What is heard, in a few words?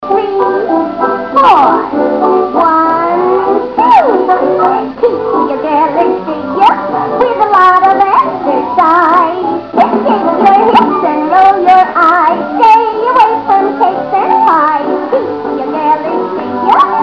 (song); Betty Boop